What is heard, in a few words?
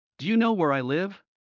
Heart mid; Classroom humanbody